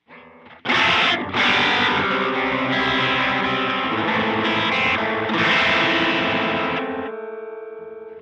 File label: CHORUS MIX 0 DETUNE MIX 100; TriceraChorus; L 40 R 40